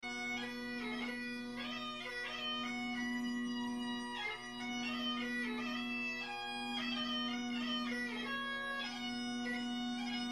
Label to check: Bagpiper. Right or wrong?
Right